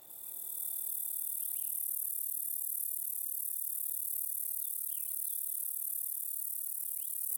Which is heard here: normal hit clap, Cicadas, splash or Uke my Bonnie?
Cicadas